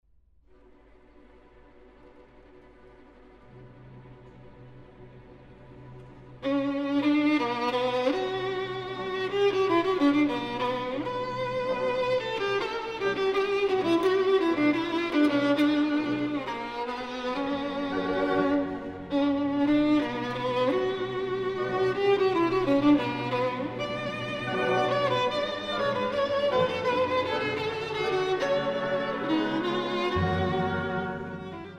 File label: Violin Concerto; Saint-Saens